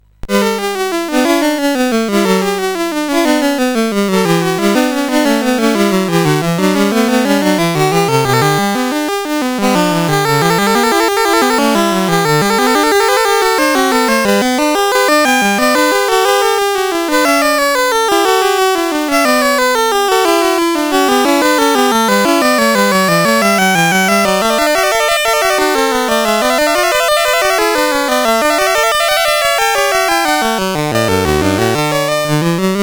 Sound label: Goldberg Variations parts 8-13 sid CSG8580R5; hvsc